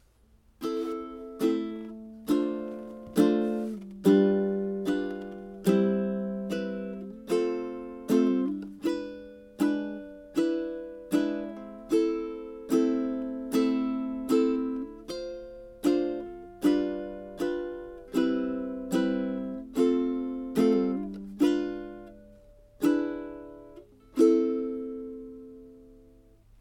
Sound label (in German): quartenkadenz, ukulele